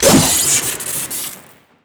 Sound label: scythe swing crit